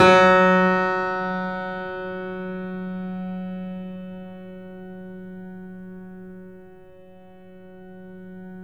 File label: Piano, YAMA SUSTAIN; InVision Interactive; The Piano